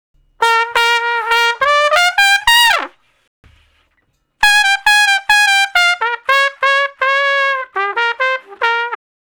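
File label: Trumpet Slow Blues (Bb I, IV, I); Crimson Blue and Fabulous Horncraft 4 RnB; Horncraft for R&B; Solos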